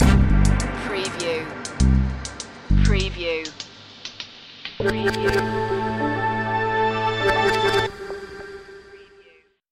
stinger, Ambient chill-out grooves, syncopated, downtempo beat